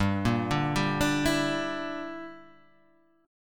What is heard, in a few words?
A sharp 6th Flat 5th